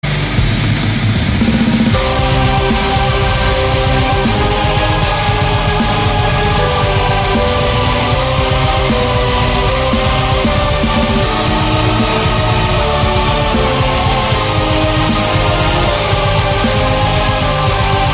Metal

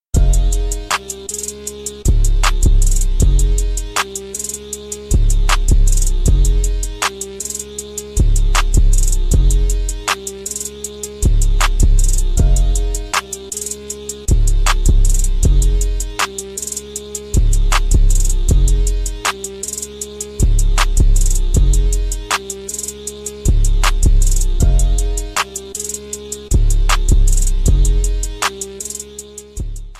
Instrumental

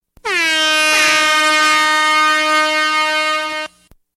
Foghorn

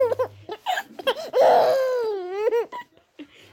Baby Laugh

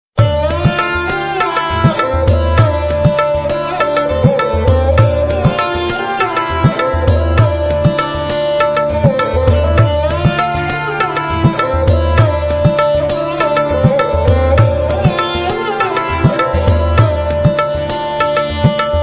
Sad Love Tone

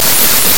Zzzzzzzzz

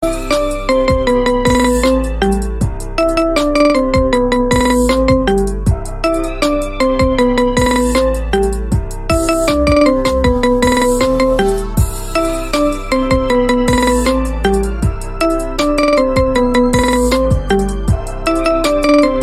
Marimba

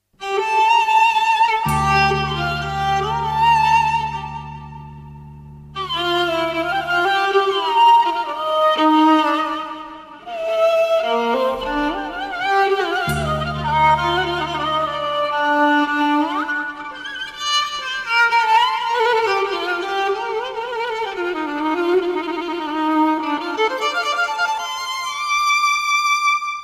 Romantic Violin

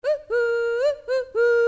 Woo Hoo

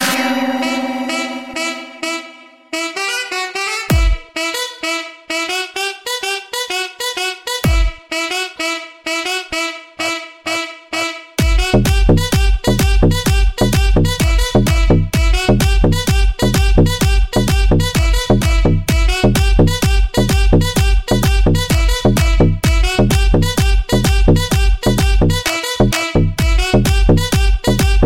Sax

